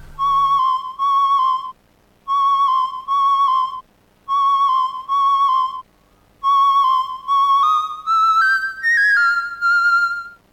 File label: Melody; Dance from Noah